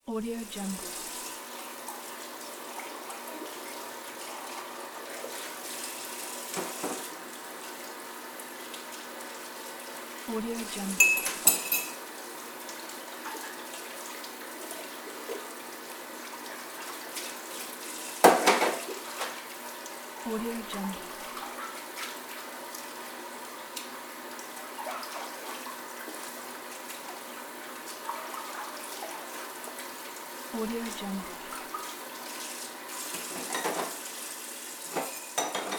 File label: the washing dishes